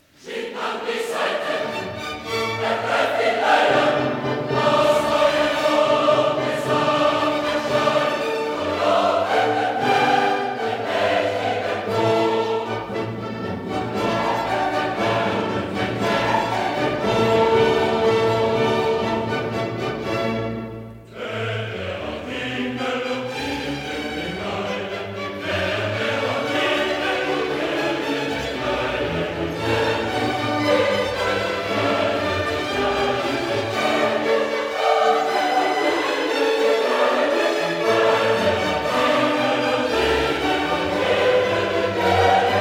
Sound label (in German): Chor; No. 10. Stimmt an die Saiten